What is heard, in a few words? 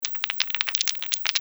Dice Shake, craps